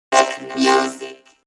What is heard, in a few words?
Pop Music; MOBICLIC 64